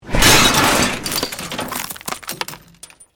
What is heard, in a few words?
EXPLOSION METAL GLASS DEBRI; Created explosion effects, house, building or vehicle effects; Bombs